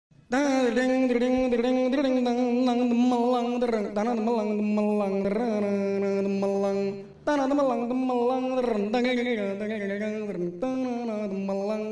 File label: trap remix